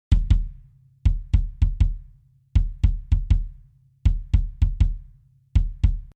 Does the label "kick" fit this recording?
Yes